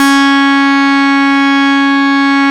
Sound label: MINI, Roland Synth Bass, Analog Bass; Roland - Rhythm Section